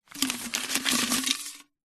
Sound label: foley money notes place inside glass jar; zapsplat